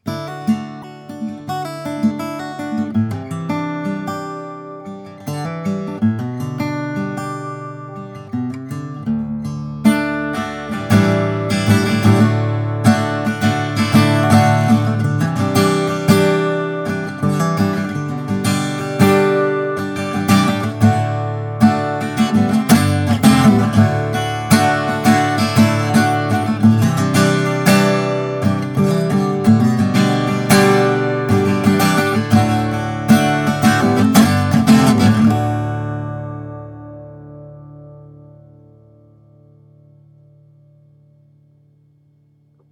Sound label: Guitar